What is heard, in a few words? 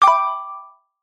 alert glassy mallet